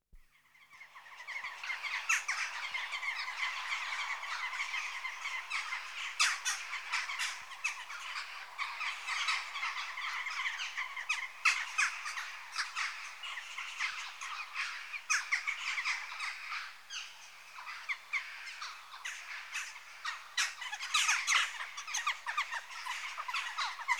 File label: Jackdaw (Corvus Monedula) Adults; Birds; BBC Sound Effects Library